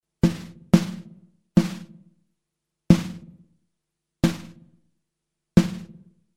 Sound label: Snare trapped noise